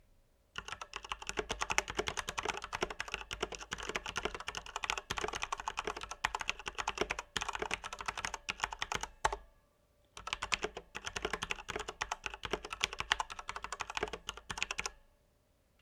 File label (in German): Sound Schreiben